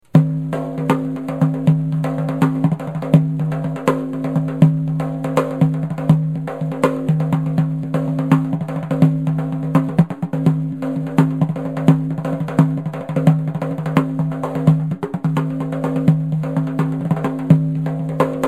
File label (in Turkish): bendir